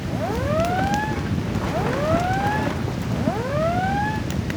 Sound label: generic ship damaged loop; Hearts of Iron IV Death or Dishonor